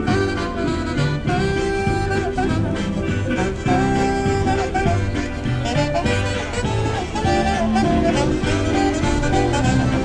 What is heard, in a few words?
talented belfast buskers